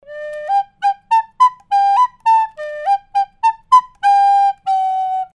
Recorder akustisch; Artinoise

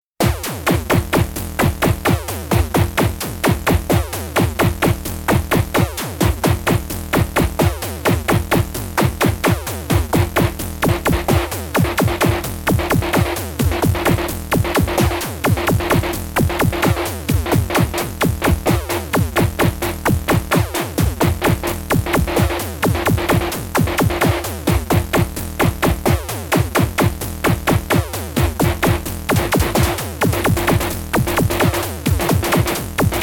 Trinity Stack Spread; Modbap Modular

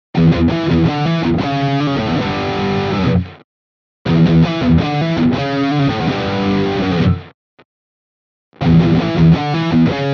Ibanez AR520HFM rhythm all positions Riff B

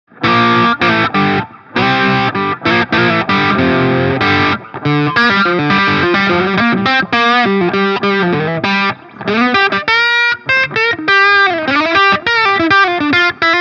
Fuzz Mode; Beetronix Nectar

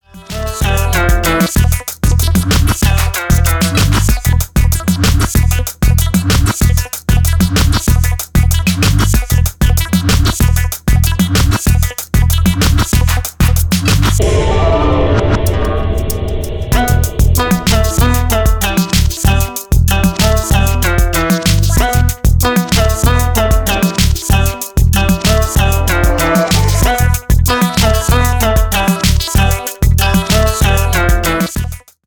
Elevated Depth Presence; Waves Online Mastering Service

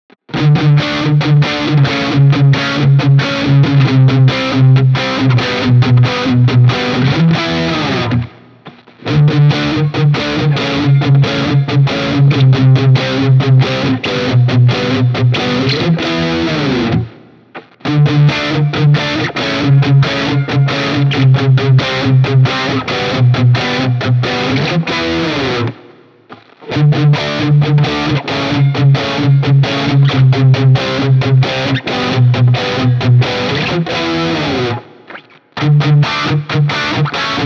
Hi Gain Riff all Positions

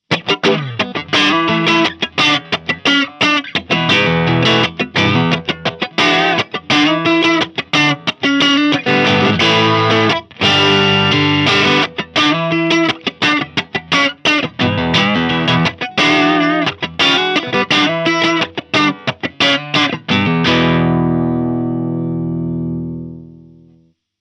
Overdrive; Eventide Riptide

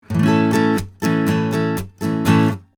Austrian Audio OC16 Strumming 40cm

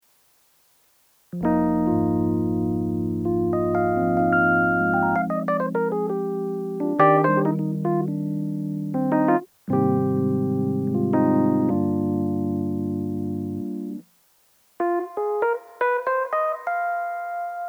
E-Piano dry wet; Behringer 646

